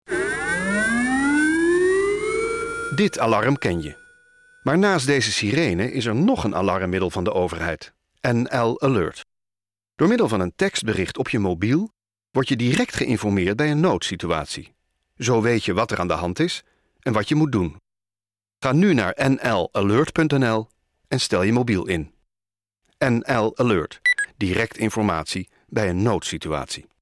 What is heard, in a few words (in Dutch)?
NL-Alert Sirene; NL-Alert nieuw 2014